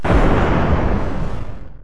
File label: Thunder